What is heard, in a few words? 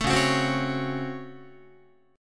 C sharp Major 7th Suspended 4th Sharp 5th, Guitar; C#M7sus4#5 strummed; JGuitar